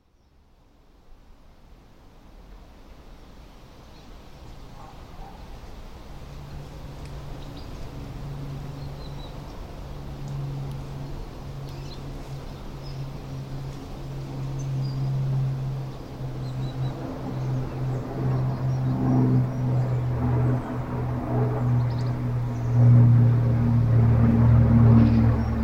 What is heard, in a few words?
Spitfire magpie goldfinch and robin